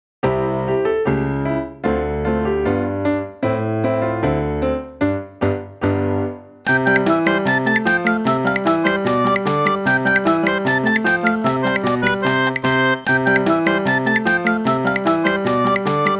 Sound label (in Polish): Na okraglo akompaniament